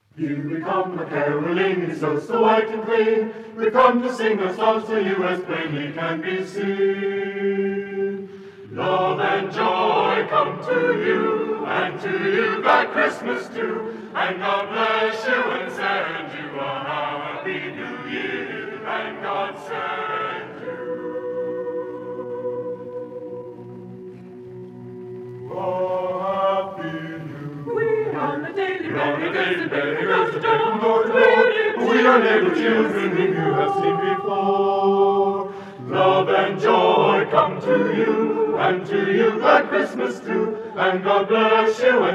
Acappella, Chorale; Here We Come A-Caroling; USC Chanteclairs

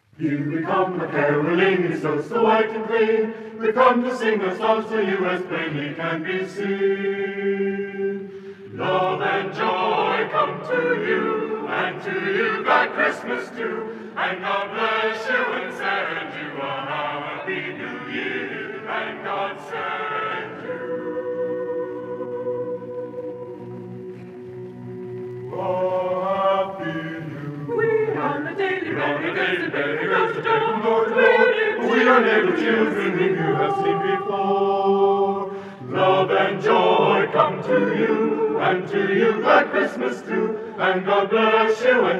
Christmas Acappella; Here We Come A-Caroling; USC Chanteclairs - Pgh

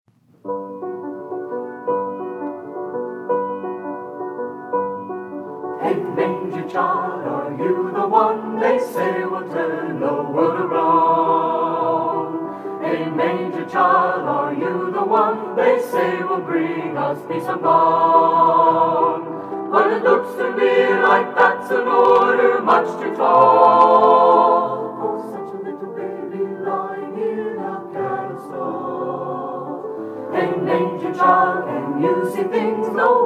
Christmas Chorale

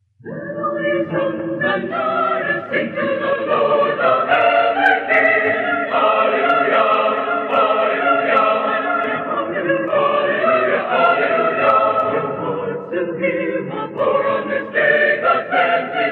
Choir, 8 part; Let All Ye Sons And Daughters (Leisring-Christmas) - USC Pantheon Choir -Pgh

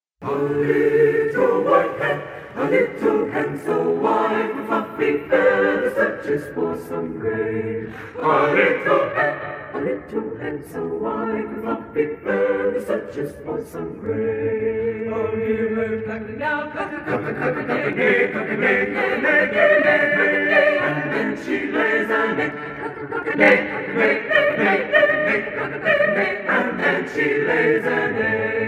Madrigal Acappella, Chorale; Scandello - A Little White Hen; USC Chanteclairs

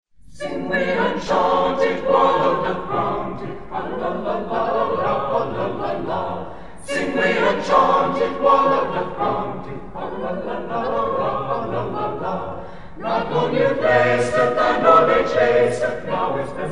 madrigal Acappella, Chorale; Morley, Thomas - Sing We And Chant It; USC Chanteclairs

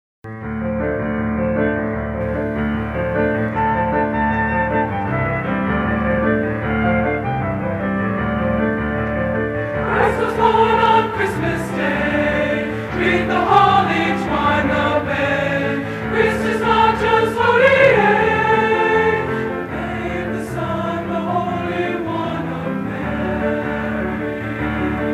Christmas, Women's Chorale; USC Women's Chorale -Pgh

